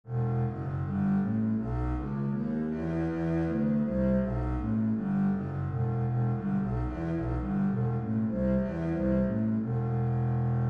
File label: bass E minor